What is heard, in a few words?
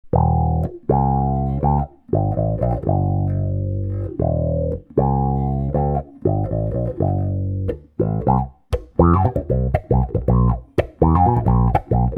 digitech auto wah; Effects; AutoWahs